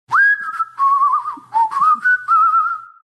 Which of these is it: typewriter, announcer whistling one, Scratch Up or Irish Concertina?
announcer whistling one